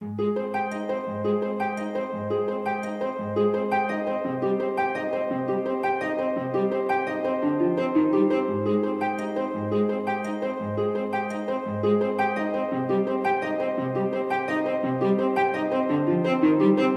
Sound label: Song 113 Bpm